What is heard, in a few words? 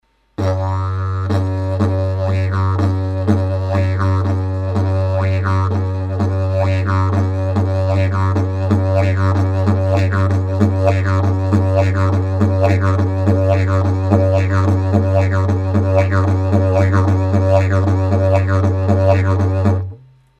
tomtom